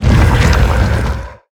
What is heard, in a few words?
roll base; smite; god geb skin7